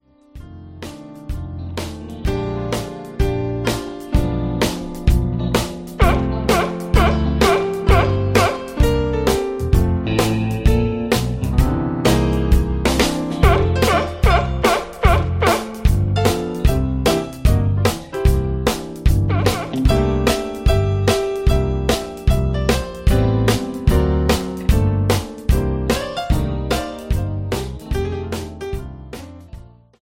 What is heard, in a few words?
Instrumental Karaoke Music Track